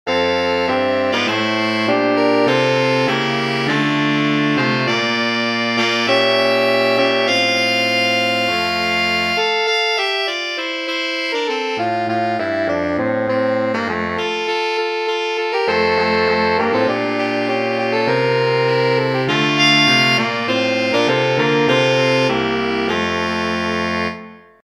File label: Carol Sax